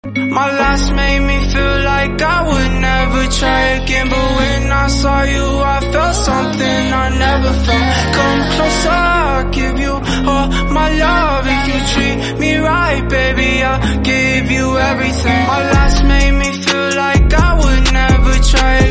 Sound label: song